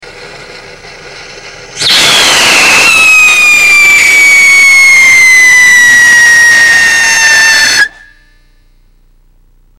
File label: whistle test iron oxide with core; Iron Oxide1; Sodium Salicylate; Core Burner; Raspy start. Lots of spikes in core burn